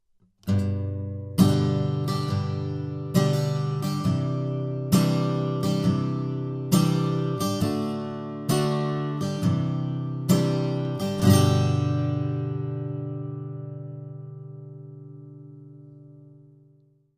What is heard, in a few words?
Acc Guitar - C12 Bi Direct; bidirectional (osmička); edge duo srovnavaci test